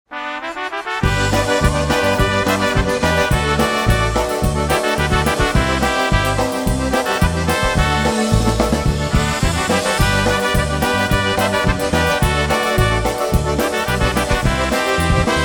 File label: Polka, Live Jam